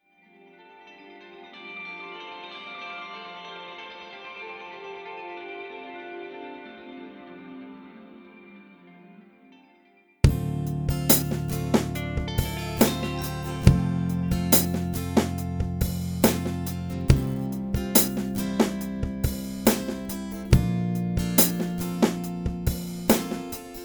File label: Instrumental version